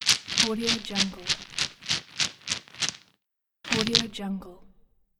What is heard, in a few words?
bingo game